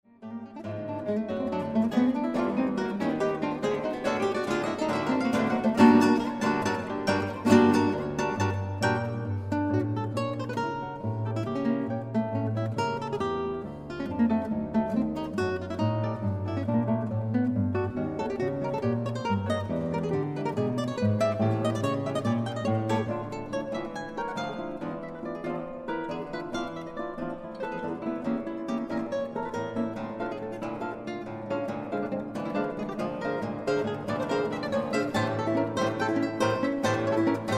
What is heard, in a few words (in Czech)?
guitar kvartet; Kytarové kvarteto